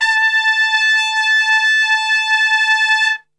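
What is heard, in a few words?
A 2 piccolo trumpet, Brass; Best Service; Brass Super Section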